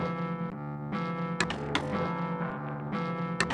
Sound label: dim grim, Loops; Polyend; Loops n trouble